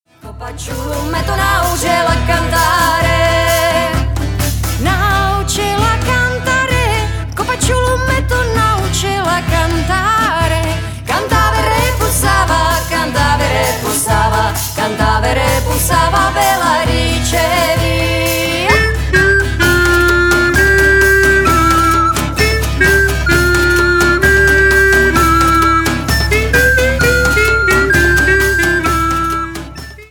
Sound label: Tarantella del gargano